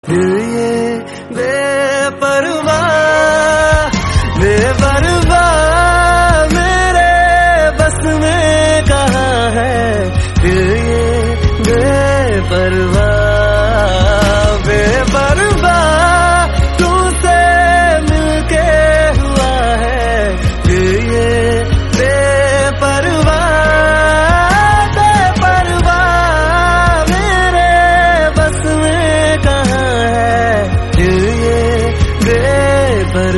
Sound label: Hindi Song